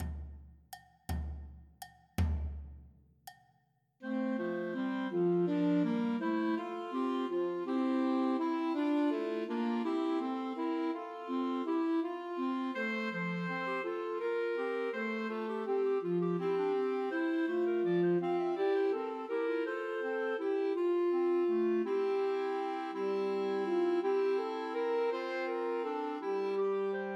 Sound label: Sussex Carol Trios for Two Clarinets and Alto Saxophone; Christmas